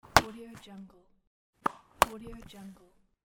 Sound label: tennis ball hits net; creativetunes